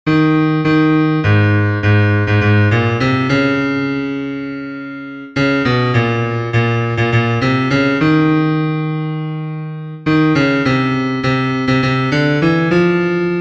BAJO, himnos en piano; cantos del camino

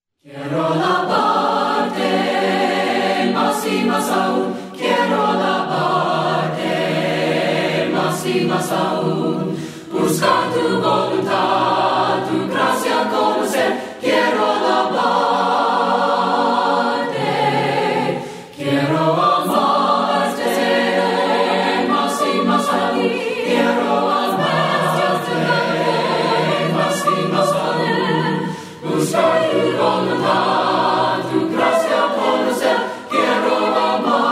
VOCES, himnos a capella; cantos del camino

